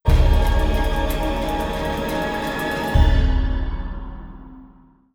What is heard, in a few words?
Stinger; Pegasus; Naxx